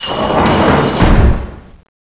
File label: open near; dm clocktower